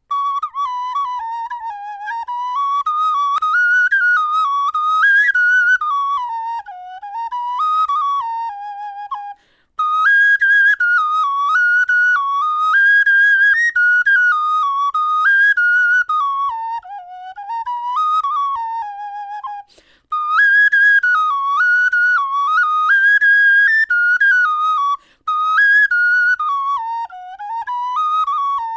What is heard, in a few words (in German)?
melodie